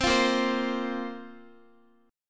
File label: Bb Suspended 2nd Bb down Guitar; Bbsus2 strummed